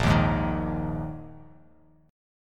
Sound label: Bb 7th Suspended 4th, Bb7sus4 chord; strummed; JGuitar